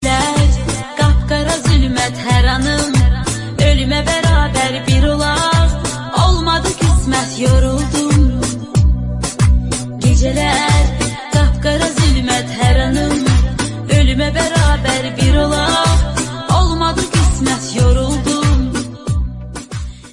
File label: Love Arabic